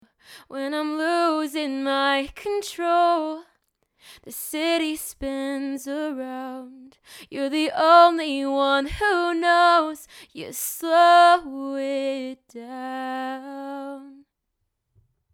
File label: sing